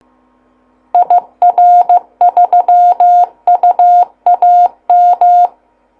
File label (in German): Kennung CW; Rittnerhorn